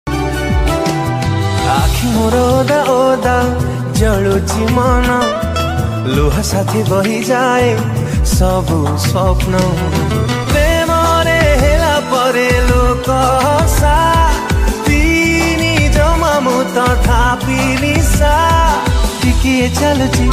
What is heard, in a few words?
Odia Ringtone